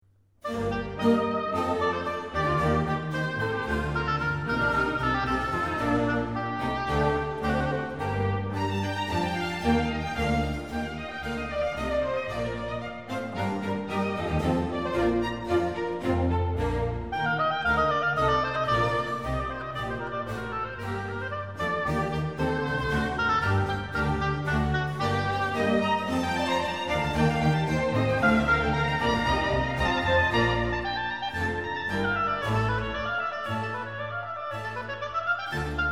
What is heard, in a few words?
Baroque Oboe Concerti